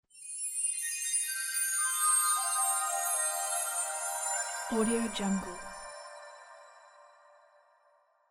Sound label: magic transformations